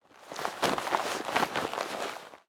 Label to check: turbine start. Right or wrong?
Wrong